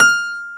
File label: STACK PIANO; AKAI S6000 CD-ROM - Volume 1